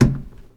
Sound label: Door Locked